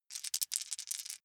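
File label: small four rattle in hand short, dice; wuerfel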